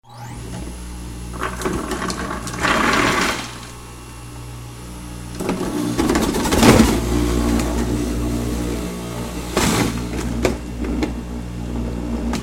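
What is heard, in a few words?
blender and juicer sounds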